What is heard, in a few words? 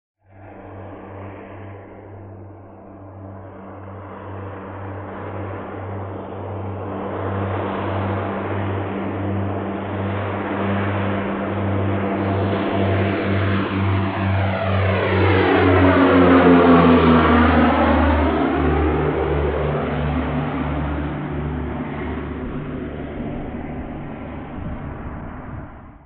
AIRCRAFT PROP NORTH AMERICAN B25: EXT: Fly By Overhe; AIRCRAFT PROP NORTH AMERICAN B25: EXT: Fly by overhead medium speed.; Ww2 Bombers